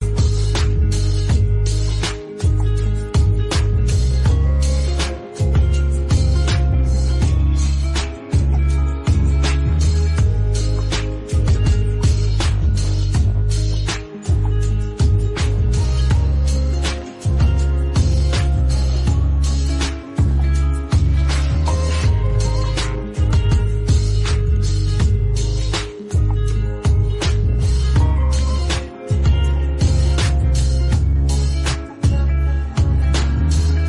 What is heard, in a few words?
Instrumental